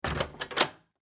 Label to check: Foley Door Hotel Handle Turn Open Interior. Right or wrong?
Right